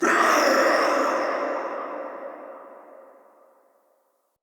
Ghost Attack, Vocals; Characters; Enemy; Nightfold Test Range Ghosts

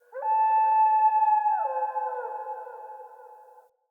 Wolf Cub Landscape Howl; Orengal

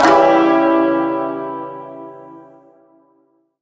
Shawzin Phrygian Chord E; Chords; Warframe

